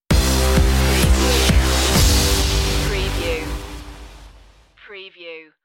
forward motion stinger

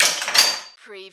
Button Sound - Metal Bench Rattle

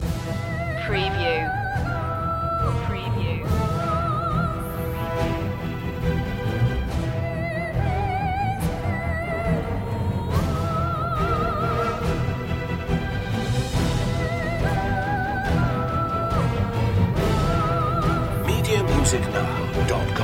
full mix

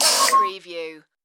Tui Croak; Bird sound effects including birds chirping and squawking

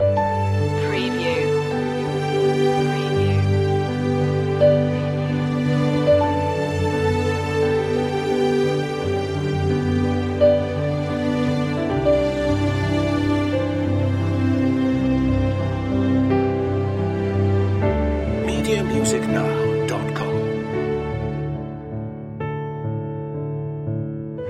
until we meet again loop

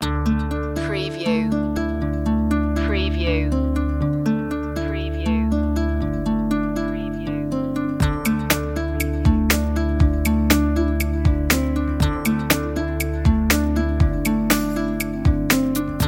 floating guitars, chill

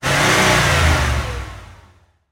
Truck Ford F150 Rev Engine Perspective

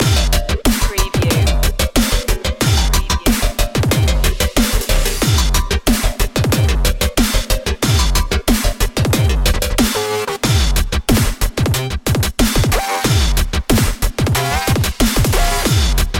dubstep of frying cpu loop

